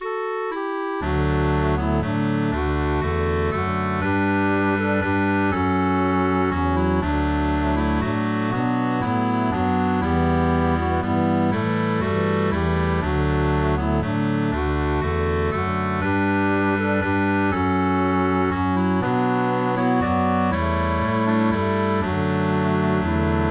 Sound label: Melody Harmony Bass; Cill Cais